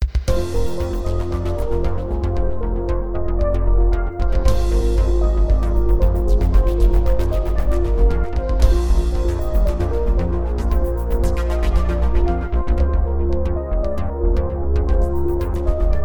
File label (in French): Tapis sonore